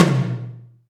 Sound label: Tom Mid, Alesis DM5 Drum Machines